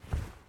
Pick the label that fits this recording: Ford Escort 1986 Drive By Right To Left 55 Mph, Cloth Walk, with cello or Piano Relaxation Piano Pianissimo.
Cloth Walk